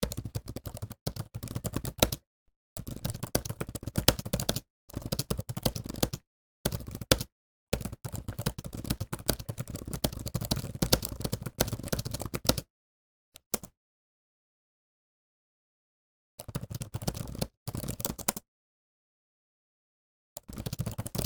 keyboard (laptop); devices accessories utilities; NS Studios - ultra sound collection

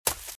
Footsteps Leaves Sprint Left; RadwinIsland

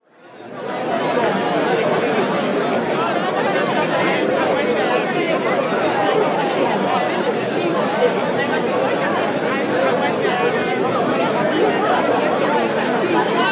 Crowd Outdoor Busy Gathering, Walla; Sound Ideas; Walla Series

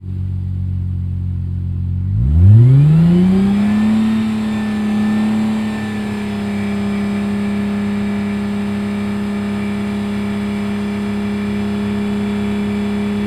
Prowler Fast Idle, Automobiles

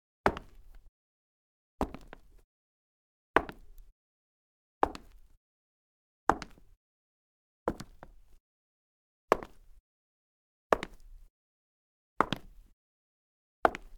Wood Soft High Heels, Foley Footsteps; Sound Ideas

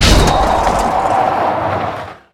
shoulder dirt hit; Blind Drive

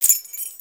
Pickup Key; SFX Kit; Pickups

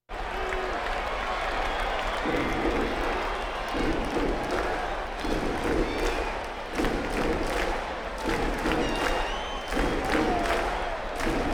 Crowds Race cars Baseball

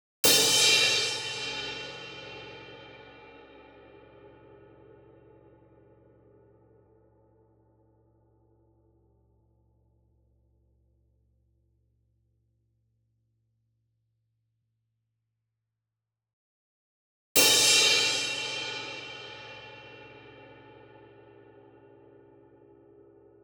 CYMBAL Crash Hit Hard Beater Near; boom library; Cinematic Strikes Construction Kit

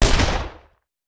Machine Gun; Sound Ideas; Guns

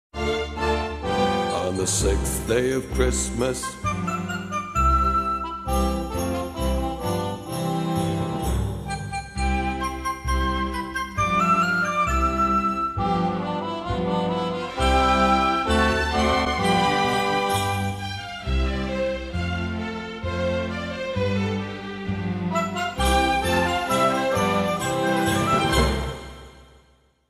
Christmas Jingle; Christmas Jingle Album; Carlin Recorded Music Library

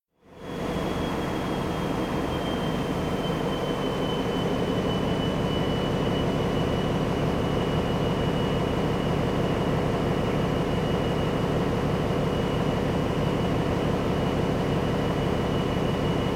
Distant engine; KMP Film Video Sound Effects

